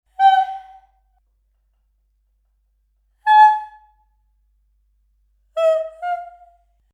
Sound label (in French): voix